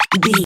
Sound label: cartoon boing climb run